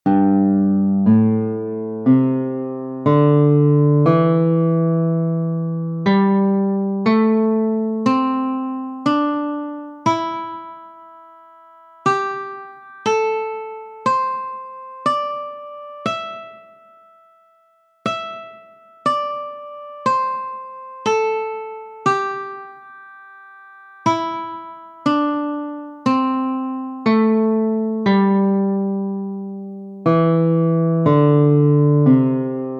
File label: Guitar Pentatonic Box; Sound Prisms Guitar